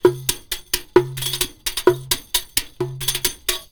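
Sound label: DEF, Oriental; Best Service; Ethnic Journey Sound Cube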